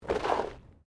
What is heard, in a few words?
Body movement Slide Gravel; Body movement foley to simulate falls and deaths; Bodies; Human